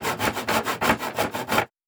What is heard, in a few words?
Saw Wood, Crafting; Survival Game Sounds - Lite Edition